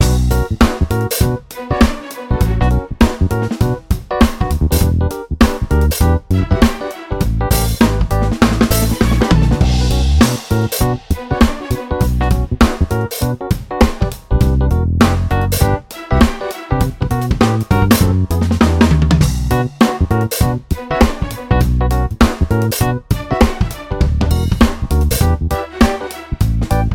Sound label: background; RS Games Sounds; I Doubt It